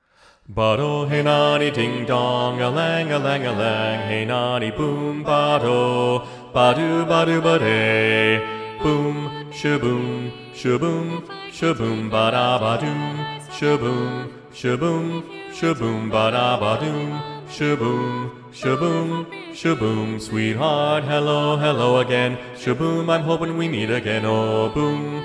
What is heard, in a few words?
BASS, SATB Bass Predominant